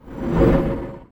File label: spell disintegrate